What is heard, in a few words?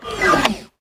UI Fast Travel Local Open